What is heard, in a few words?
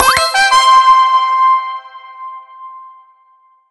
Bounty Complete; Skylanders Cloud Patrol; Arcade, iOS, Mobile